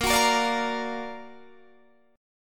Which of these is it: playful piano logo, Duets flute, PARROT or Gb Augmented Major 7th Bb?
Gb Augmented Major 7th Bb